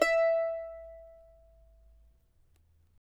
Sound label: charango E4; Spectrasonics; Hans Zimmer Guitars VOL-2